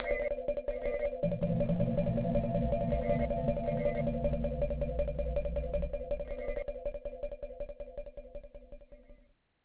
accident percu

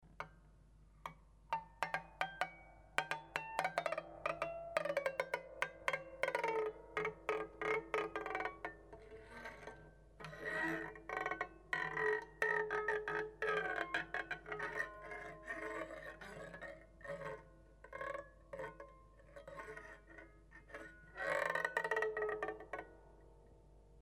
fils vertical, harpe

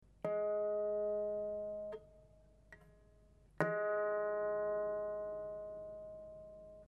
table, harpe

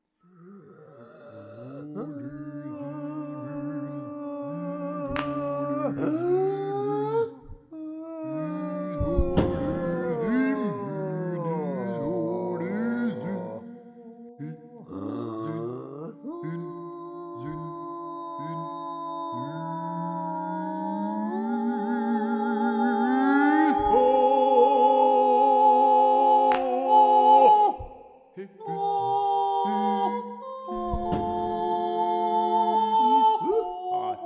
fantaisie salle de bain, voix

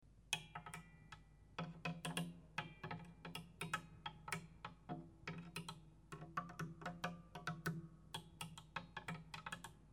archet métal, harpe; touchers de la harpe

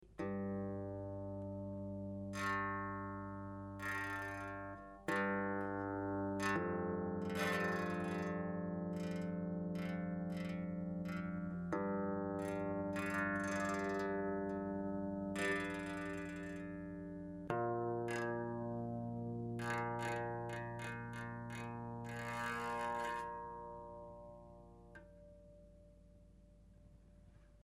fils cordes metal zing, harpe

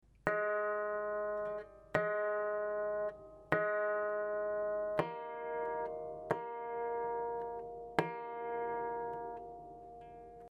table, harpe

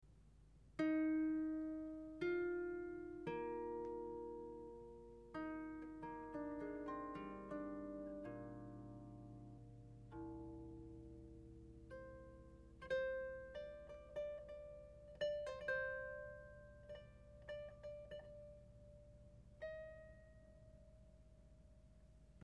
naturel, harpe

